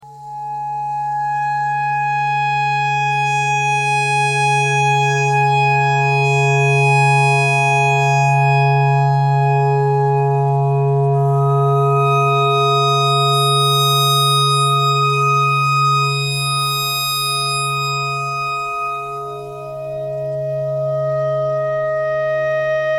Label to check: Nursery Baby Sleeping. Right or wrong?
Wrong